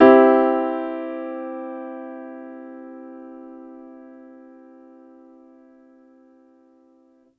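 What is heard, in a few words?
Piano Grand major med; TrashLibrary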